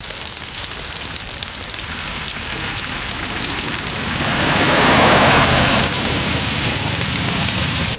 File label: dense hail crackling and wet vehicle pass by